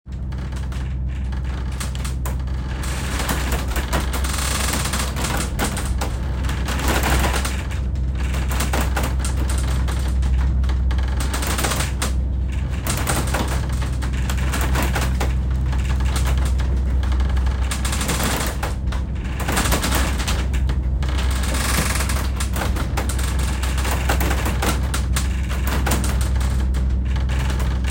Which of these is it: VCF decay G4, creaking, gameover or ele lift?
creaking